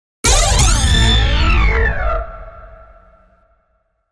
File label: Sonic Phaser